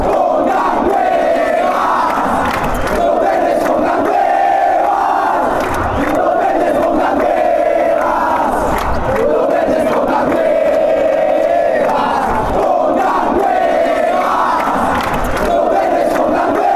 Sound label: chant; atlctico nacional